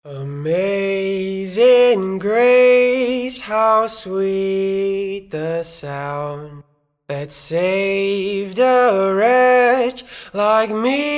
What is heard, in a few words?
amazing grace piano boy vocals